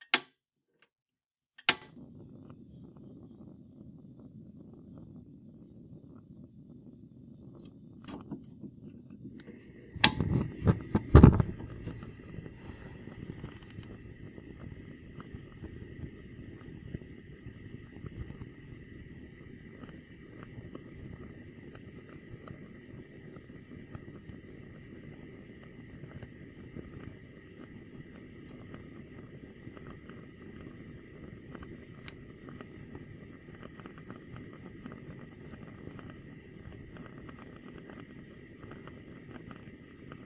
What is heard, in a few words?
gas fire lighting